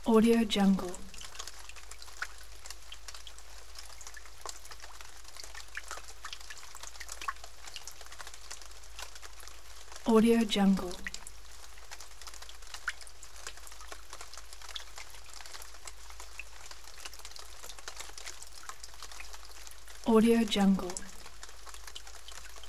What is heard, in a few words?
rain aftermath; shining-wolf